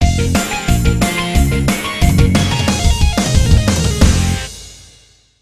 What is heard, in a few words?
Jingle - Rock